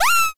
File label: pico surprise